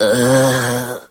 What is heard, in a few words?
uh moan; ooh ahh